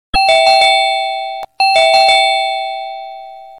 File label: Two Tone Doorbell